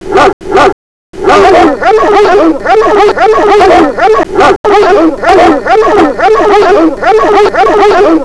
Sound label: Dogs